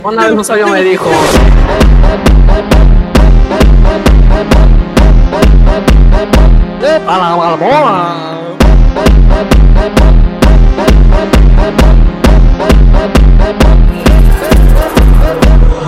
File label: phonk